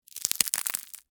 Crack Crunch Soft, Texture, Crack, Crunch, Soft, Ice, Cream, Cone; Blast Wave